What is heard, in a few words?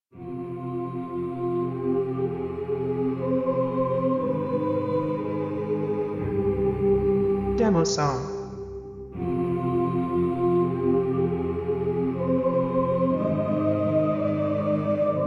Sad Little Girl Spooky Voices Short